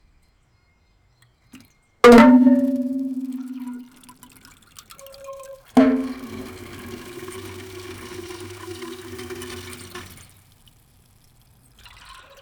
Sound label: the sound of a watering, garden